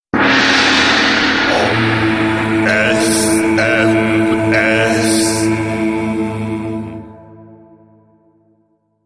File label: Sms Tibet